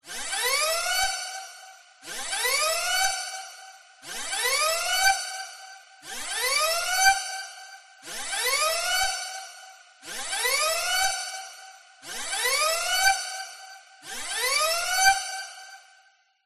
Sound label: science fiction alarm nuclear radiation emergency; zapsplat